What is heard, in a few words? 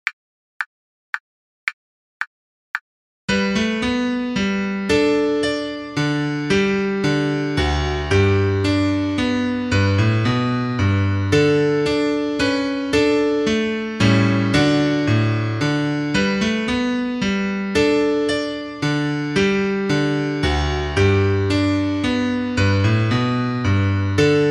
minuetto piano; marais; violon piano